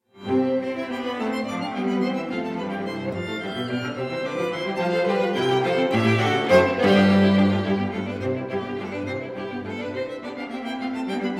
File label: string quartet in f minor 3rd movement allegro giocoso; wilhelm stenhammar